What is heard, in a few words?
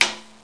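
s door open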